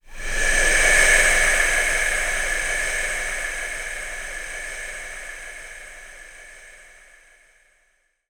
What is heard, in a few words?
White Noise FX Am 65Bpm; STEMS; Ghosthack; SkyRealm; Kit 27 - The Crossing Of Jade Sea